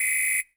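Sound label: WHISTLE, drum machines; JV-1080 DRMS; EdgeSounds; Drum Mashines VOL-1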